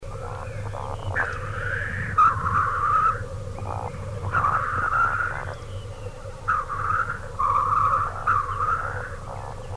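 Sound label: woodcock; Краткие сведения об основных охотничьих животных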